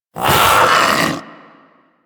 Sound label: Vocal Attack Short, Hunting Critters; Warframe; Vulpaphyla